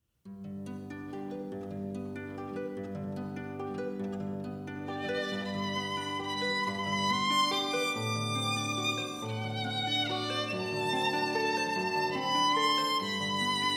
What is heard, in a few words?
Duet of Violin and Harp; Mendelssohn, On Wings of Song